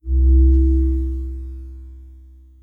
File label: Power Up